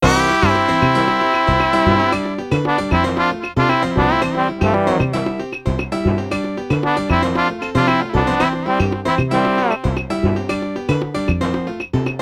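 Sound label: Movie background music, Salsa; Mario Artist Talent Studio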